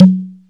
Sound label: drum, c2, YAMAHA R5 RX7, DRUMCOMPUTER; Retro Sampler